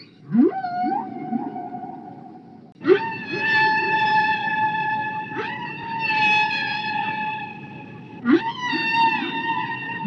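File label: orca; kosatka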